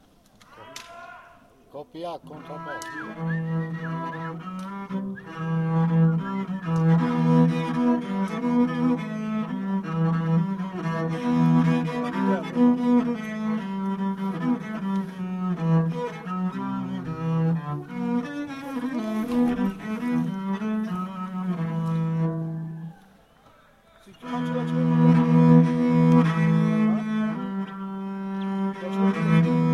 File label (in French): Interlude viole de gambe